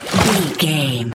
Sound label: footstep water splash med wading